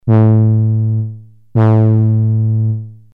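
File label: distortion; DISTORTIONボタンは、フィルタリングしたあとの波形をクリップしたような一種のエフェクトを加える。; ノードリードシンセ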